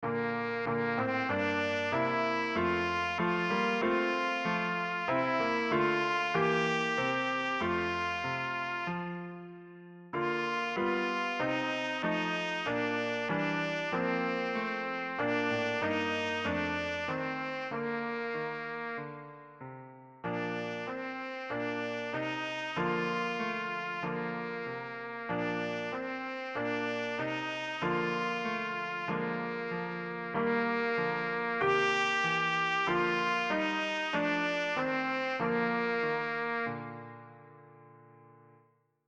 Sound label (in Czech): trubka klavir; Narodil se Kristus pán